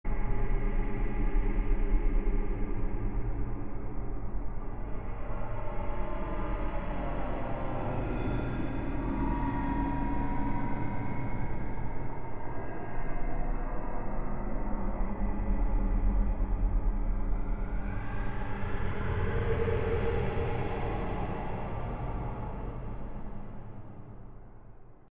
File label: projectile; manhunt2; dixmor